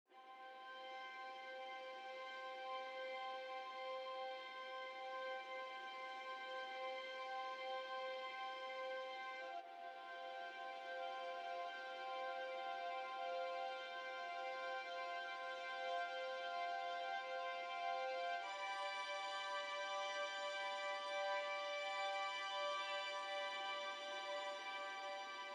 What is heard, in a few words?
Viola Harmonics Sus